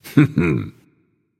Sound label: heh heh; danzaburou